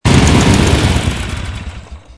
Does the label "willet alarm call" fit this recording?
No